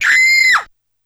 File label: Scream, Yamaha PSR-E323 SFX Kit; drum machine